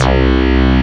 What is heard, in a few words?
FM Bass